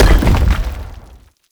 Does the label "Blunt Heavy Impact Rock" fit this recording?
Yes